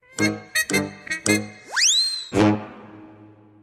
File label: game over